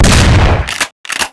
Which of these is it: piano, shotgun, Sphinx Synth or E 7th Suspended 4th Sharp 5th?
shotgun